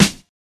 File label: focused steel snare drum f sharp key